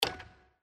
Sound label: Reel Stop; St Paddys Day Blast